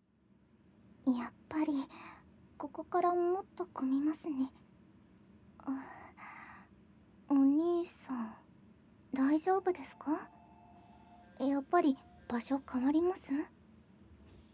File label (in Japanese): 耳舐め手コキとささやき応援, ASMR; SE控えめ